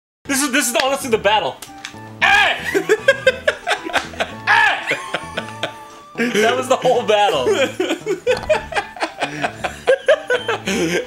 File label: Goon Growl; Game Grumps